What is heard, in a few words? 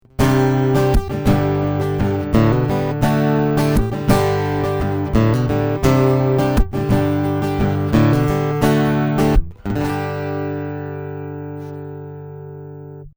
Bass